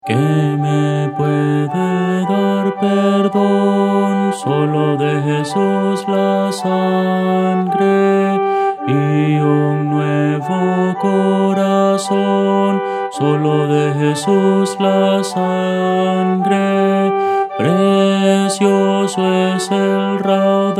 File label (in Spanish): contralto; Voces para coro